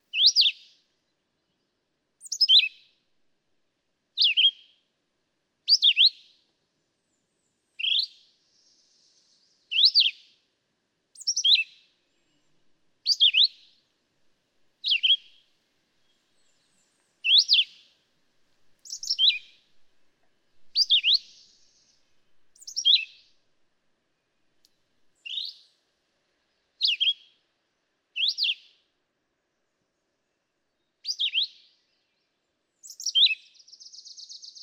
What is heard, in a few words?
Philadelphia Vireo